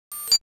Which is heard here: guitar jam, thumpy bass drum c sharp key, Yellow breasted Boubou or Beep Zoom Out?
Beep Zoom Out